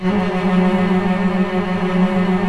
CELLI M TRLL; OMI - Universe of Sounds

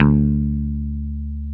BS DANOBS, Dan-O Bass

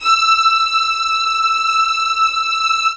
LD2 SUS E6, LOUD VIOLINS; Q Up Arts; Denny Jaeger Master Violin Library

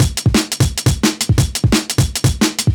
DNB BREAK; m8 breaks

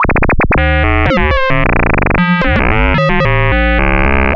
LFO, Noise; Sonic Foundry (Sony Creative Software); Prototechno

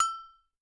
Agogo; Yamaha DTXpress-II; Perc

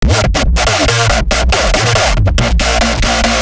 140 BPM A Sharp Acid Bass

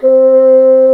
WND BSN, Bassoon; Miroslav Vitous - Solo Instruments VOL-1

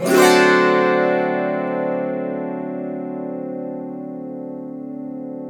AUTO HARP, Auto Harp Min 7

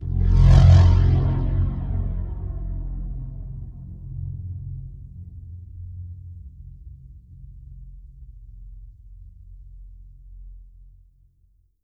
back bass; Effects

